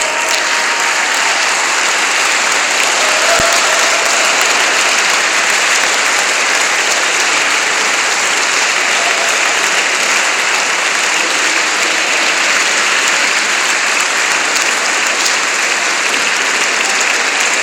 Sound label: audience applauds minutes long